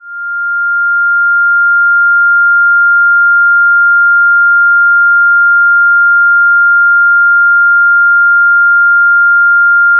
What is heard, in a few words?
Tinnitus